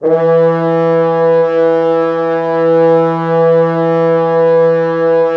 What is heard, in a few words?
Orchestral Brass